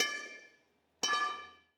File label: metal small bar fall on ground distant; matter mayhem; soundmorph